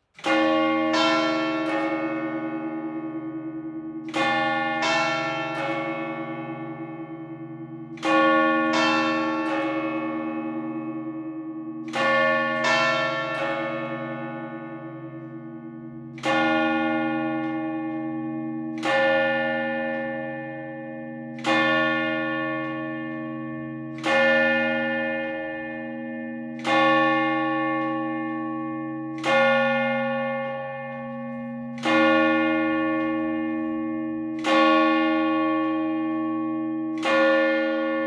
clocher mix

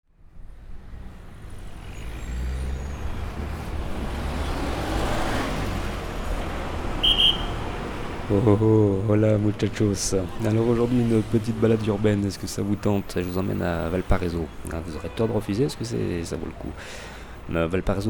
ambiance valparaiso

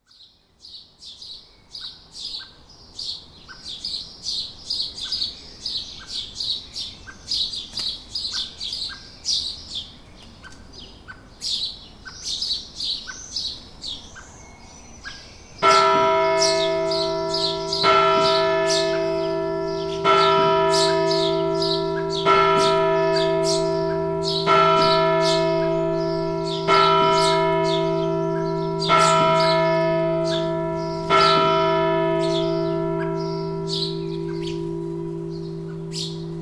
eglise conflans

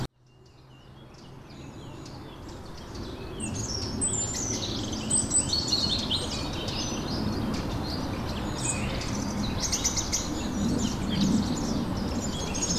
Oiseaux bois; MZ-N710; le Carre Rochet

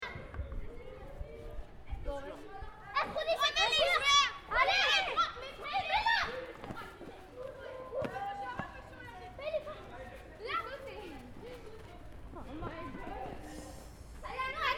enfants qui jouent au ultimate

